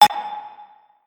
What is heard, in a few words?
notify